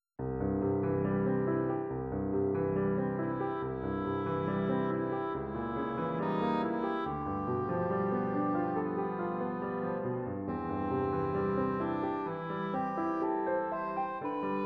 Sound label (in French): Si mes vers avaient des ailes C accompaniment